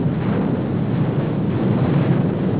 fire

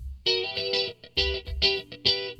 GUITAR, ROCK POP; MAGIX

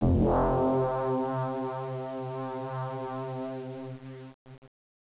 ARROW

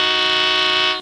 HORN

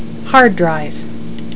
HARDDRVE

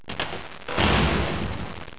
DOOR

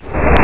DOOR UP; ABUSE

